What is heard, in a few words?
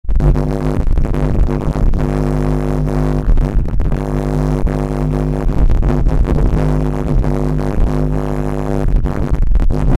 marching band failure